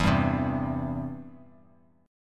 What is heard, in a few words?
E-Minor Sharp 5th; Em#5 strummed; JGuitar